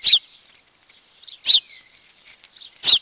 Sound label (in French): moineau